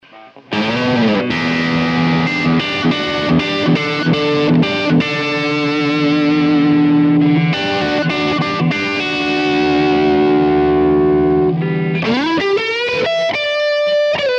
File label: PlexiRanger Plexi plus Boost; Carl Martin